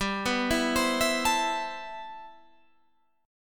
C Major 13th G

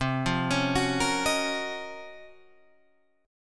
C sharp Major 13th, chord; C#M13/C arpeggiated

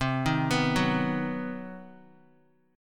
C Minor Major 7th Flat 5th chord, Guitar; arpeggiated; JGuitar

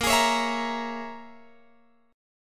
A sharp Minor 7th Flat 5th A sharp, Guitar Open F; A#m7b5 strummed; x,17,20,20,19,17

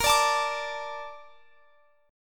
A sharp Major 7th, Guitar Standard; x,x,20,19,22,22; strummed; capo=9

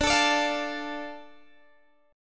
D Minor D Guitar Drop D

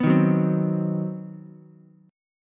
C Minor Major 7th Flat 5th chord, Ukulele; strummed; JGuitar

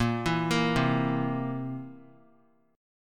B flat Suspended 2nd Flat 5th chord, down, Guitar; arpeggiated

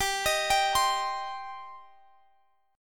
G Suspended 4th Sharp 5th

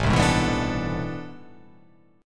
A Minor Major 11th, down

